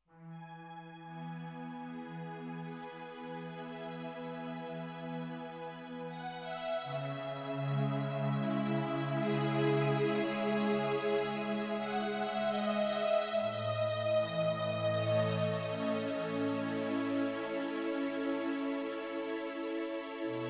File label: Strings